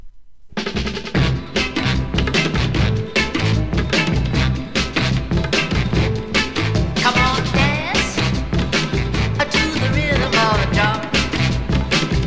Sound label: drum rhythm